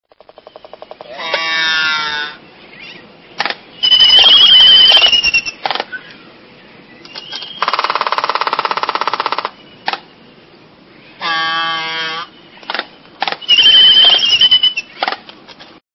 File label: Laysan Albatross